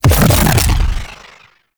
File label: Proj Perfect Pulse Impact; Weapons; Warframe; The New War; Omicrus